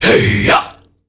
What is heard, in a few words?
HEYYA, AFRO NOISE, World Voices; ILIO; Vocal Planet VOL-5